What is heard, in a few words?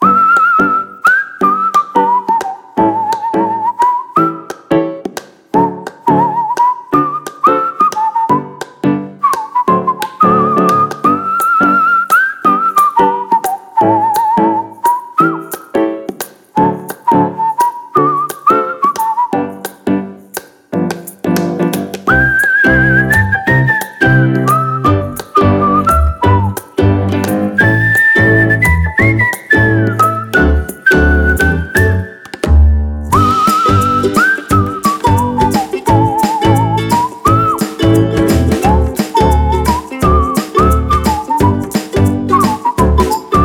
morning whistle